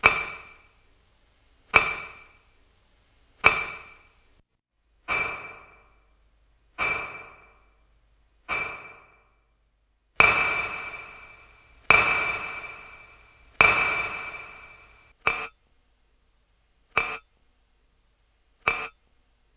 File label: Reverb Artificial